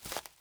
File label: foliage step; teardown ps5 windows xbox series xs gamerip 2022